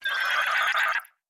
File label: Cry